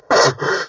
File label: redout short; War Thunder